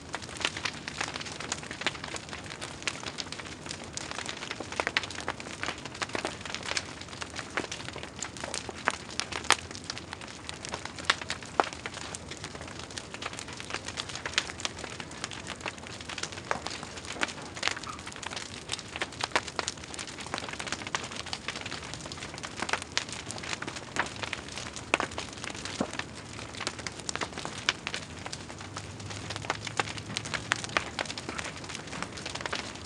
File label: Small Campfire; Rainforest